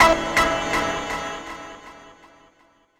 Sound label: Trumpet Echo